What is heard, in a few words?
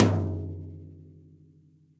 Tom Low Cop Style; Drums; Drum Hits; Ableton; Session Drums Studio; MPE